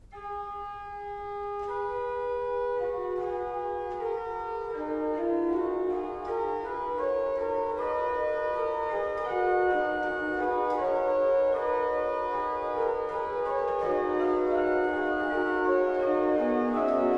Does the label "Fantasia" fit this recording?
Yes